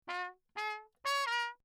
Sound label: TRP MUTE Lechner phrase; Perinétventiltrompete; Mutes